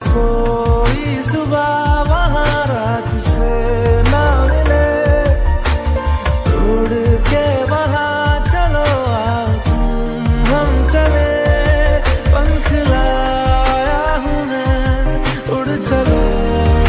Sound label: ringtone, bollywood ringtones